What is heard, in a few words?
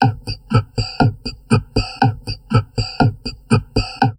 TALK, Drum Loops Crazy Processed